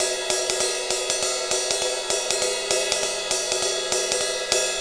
Ride Cymbal Pattern

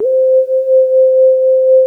PAUL LEAD, ARP, Analog Fantasies; USB Soundscan vol.49; AKAI

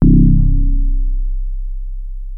Access Virus B; Synthworx

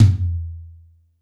A2 - Room Tom, Yamaha PSR-E323 Room Kit

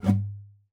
GUITAR FX; Xsample VOL-2

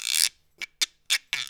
Roots Reggae; Sounds Good

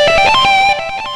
xtra lead

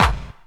KIT, Dance Mega Drums, HIP; Best Service

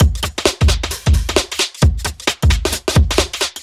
Beat Mod 132bpm, uk garage

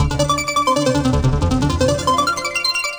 Seq Twinklearp, Drum N Bass Keys Pads; Sonic Foundry (Sony Creative Software); Knutrix Drum & Bass

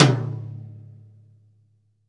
TOM L, GM DRUM SET; AKAI S-Series CD-ROM Sound Library VOL-8

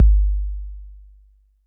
808 BD, TR-808; Crypton - DrumBase5000

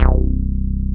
RESO, Synth Bass, Roland

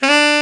TENOR SAX D; Northstar - New Gold VOL-1

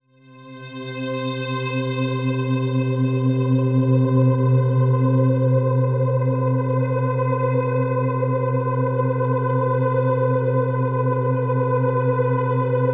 Floating C, Ambient; Trance Formation

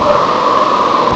WIND; M8; CMI Factory

